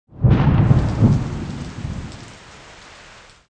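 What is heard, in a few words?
Thunder & rain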